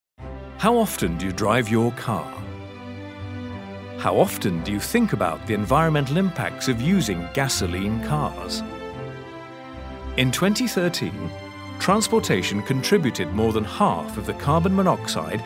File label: inspiring 110bpm; ecocar